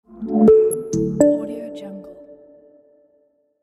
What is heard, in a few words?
logo transition